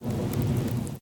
pet idle; smite; vulcan

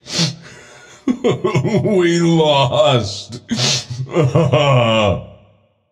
boo hoo; smite; arthur

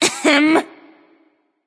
clear throat; smite; serqet

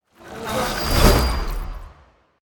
intro magic; smite; daji

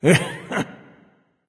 hehe; chronos

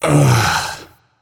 ugh; smite; shiva

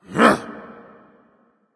grunt attack; smite; osiris

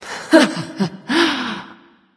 laugh; smite; voicepack; serqet

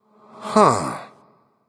confused

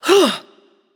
grunt attack; babayaga; smite

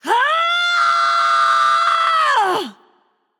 battle cry; smite; aphrodite

